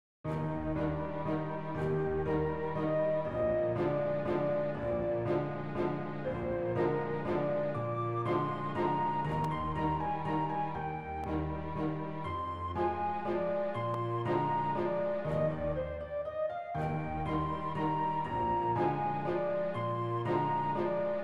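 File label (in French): valse de salon pour petit ensemble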